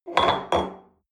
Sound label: espresso upset table soft, restaurant cafe; sport-freizeit